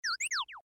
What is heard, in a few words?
Blush Tone